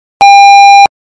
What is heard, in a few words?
signal loud